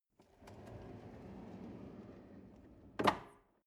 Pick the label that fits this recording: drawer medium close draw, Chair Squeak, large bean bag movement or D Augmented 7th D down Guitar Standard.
drawer medium close draw